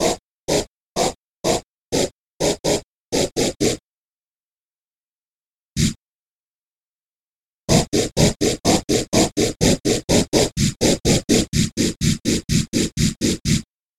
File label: breath noise